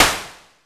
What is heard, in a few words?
WN SNARE, synth perc; Ambient; Zero-G; Ambient 1